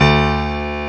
PIANO D1; St.Piano&Strings

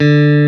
ELECT. BASSES; OMI - Universe of Sounds

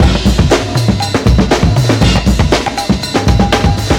Finger, Breaks

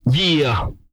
yeah, vocal, hip-hop; TB HIP-HOP

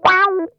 CROAK LICK, Funk Guitar; Zero G - Funk Guitar

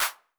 Clap, Roland JV 1080, Drums

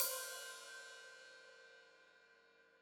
Hat Open, Hi-Hat; Deeply Sampled Drums; Cymbals Stereo

